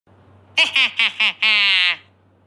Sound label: goblin laugh